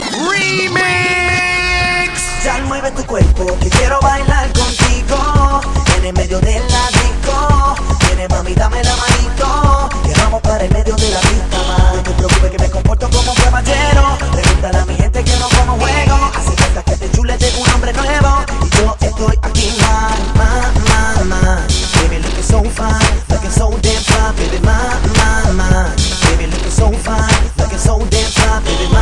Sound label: Is Calling RNB